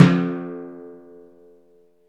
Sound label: PRC TIMP F2, Timp Cresc; Roland LCDP03